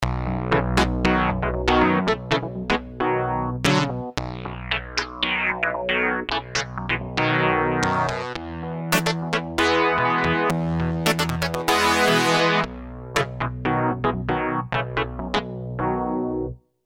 Power Clav, V-Synth

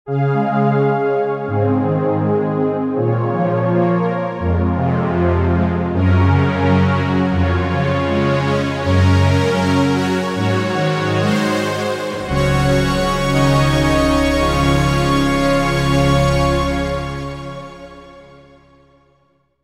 Majestic, v-synth

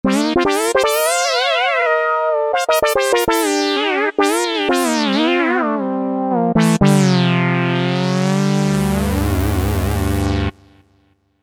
Funky Saw; V-Synth

